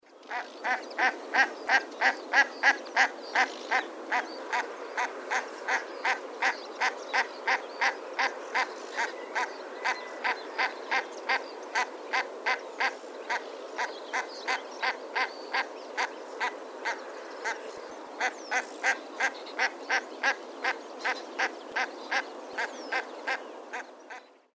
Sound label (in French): tadorne appel